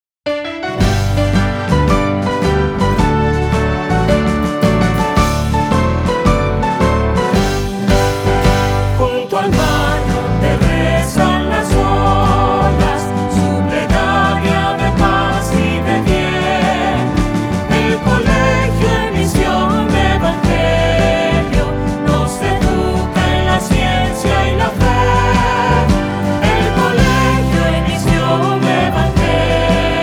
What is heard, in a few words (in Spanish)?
himno